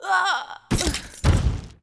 Mob die; Monster; 설갑호위

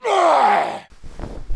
Mob die; Monster; 흑룡방; 흑풍장주

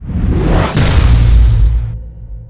skill shot fire

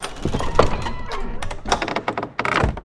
door die; 흑룡결 GATE

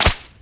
leather bash flesh

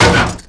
pot

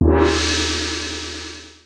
duel count

